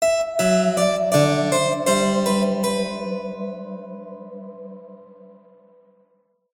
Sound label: Music Bourree Cue